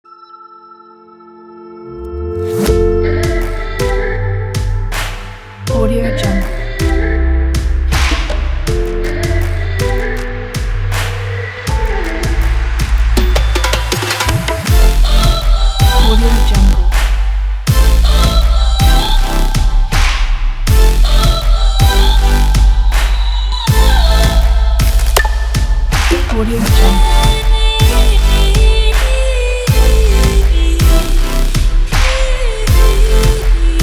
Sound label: summer uplifting future bass